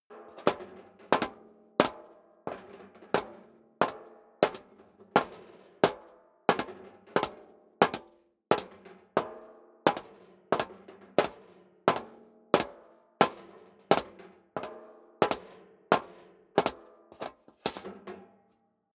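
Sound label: step high heels metal rattle walk sequence, footsteps; schritte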